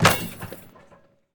Street Ball Dunk Chain; SFX Kit

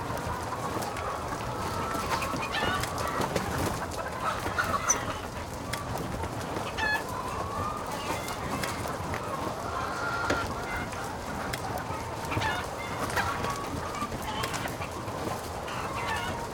dirt chickens loop; Blind Drive

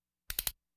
Hospital effects

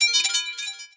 Menu Magic

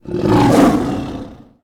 roar; Blind Gladiator

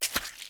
boots mud; Apotheorasis

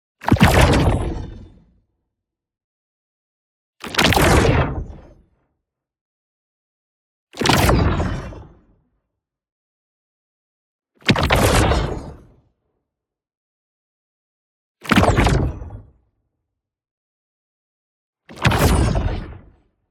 ABILITY CHARGE Warping Cast; boom library; Matter

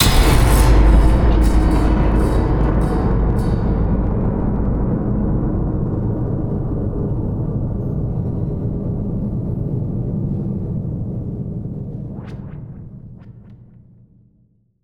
STING dead can slam, Cinematic Metal Impacts

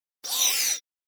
Servo Movement Small Fast; Hollywood Edge; sound designer's toolkit v2

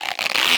Latex Glove Stretch; Medical; Blastwave

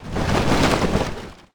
Lava Emerge; Destruction

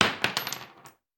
Bullet Shell; Sound Ideas; Guns

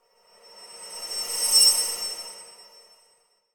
Impact Metallic High Squeaky Impact - Passing; Hollywood Edge; sound designer's toolkit v2

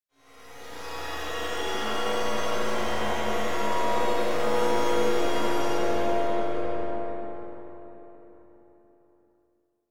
Stinger Metallic Musical Cluster Wash; Hollywood Edge; sound designer's toolkit v2

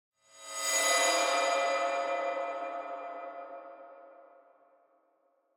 Drone Metallic Transition Tone; Hollywood Edge; sound designer's toolkit v2

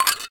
Hit Glass Jar Clink; SFX Kit; Foley - Misc

